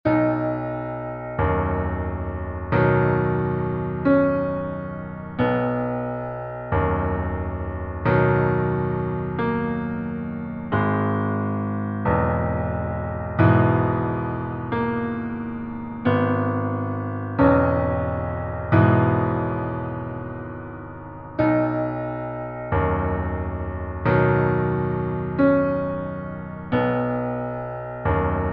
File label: Canzone del Luna Melody and Bass